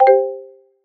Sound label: outgoing declined